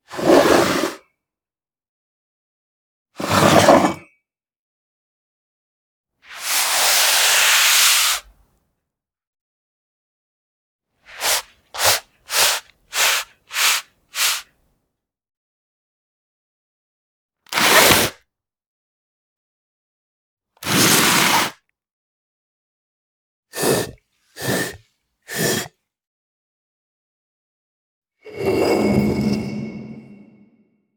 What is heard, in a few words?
Moving Object Whoosh